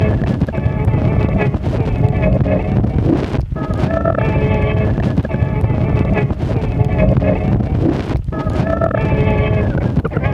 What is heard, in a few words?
burning a reel tape loop